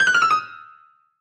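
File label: Piano Fast Comedy; keyboards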